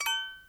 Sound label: Click